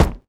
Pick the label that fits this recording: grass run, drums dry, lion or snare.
grass run